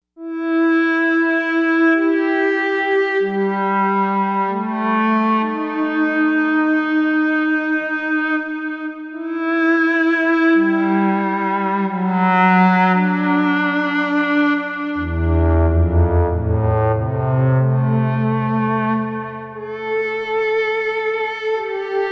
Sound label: Metahorn Bow, Novation UltraNova; Factory Patches